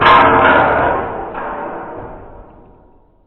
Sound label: hit; ambient